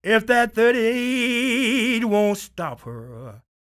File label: SING, Blues Soul; Spectrasonics Vocal Planet